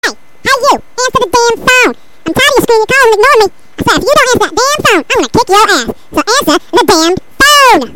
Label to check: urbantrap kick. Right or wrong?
Wrong